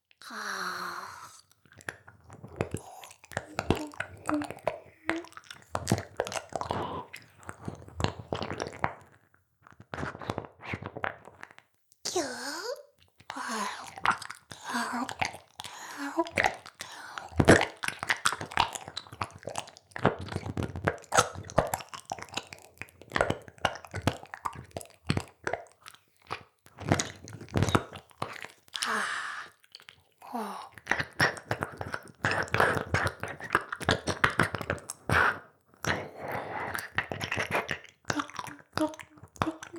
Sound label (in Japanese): 耳舐め, ASMR; 淫妖精の森; オトナダモンチロのソロパート